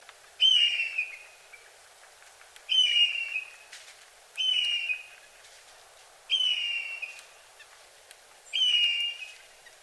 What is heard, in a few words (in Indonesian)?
blue jay meniru suara elang